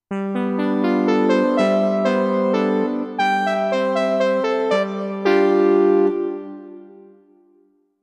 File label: Wire Lead